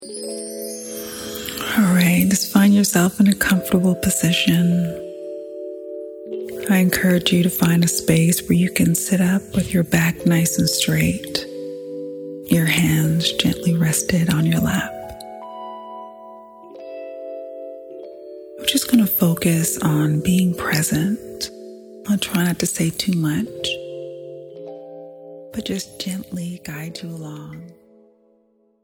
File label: Self Love Melody